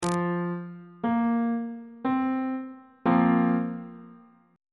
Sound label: b flat suspended 2nd 2nd inversion on bass clef